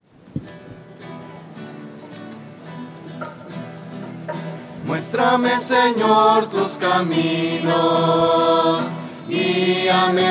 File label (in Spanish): voces; muestrame sennor tus caminos; misa